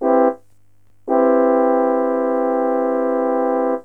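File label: major 7th, synth chime; HORNS; cubase; CUBASIS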